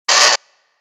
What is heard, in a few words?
electric arc; TOS